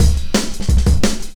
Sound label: 175, Breaks; Drum&Bass; EZ Rollers